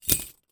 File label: foley handcuffs metal drop on carpet